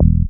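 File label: bass, Jungle; E-Lab; XTC Files of Jungle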